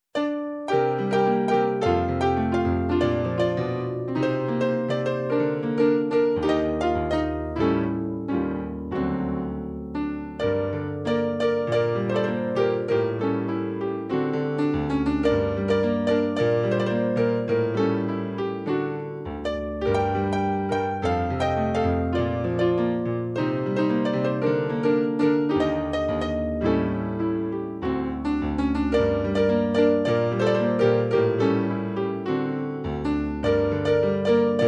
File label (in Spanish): spanish bolero clasico, midi